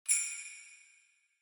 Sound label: Sleigh Bells Reverb Knock Gentle